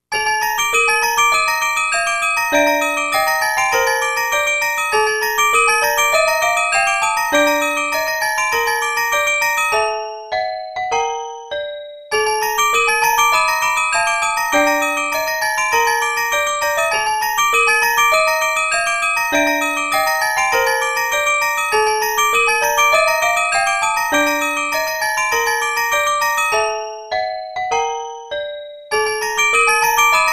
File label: gamelan